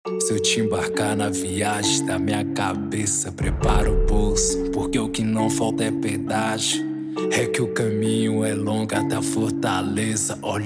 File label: rap trap